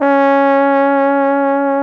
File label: BARITON; Best Service; Alpine Volksmusik